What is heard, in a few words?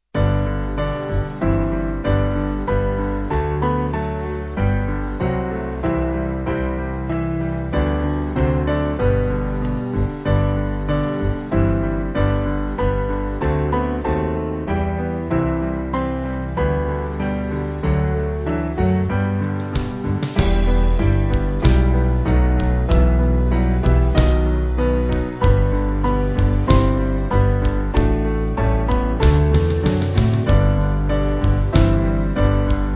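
Band, Music Backing Files for Churches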